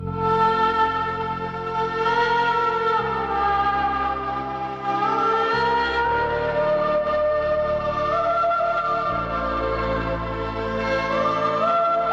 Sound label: dream music